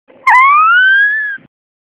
Chufletillo

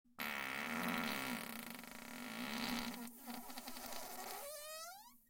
Encerrado con final agudo, pedo

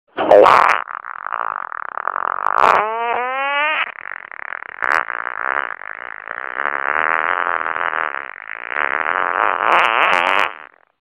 Pedo de biblioteca

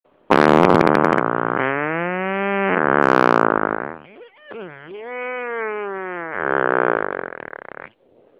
Polipedo

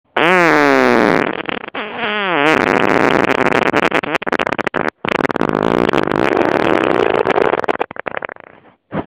Pedo judias blancas

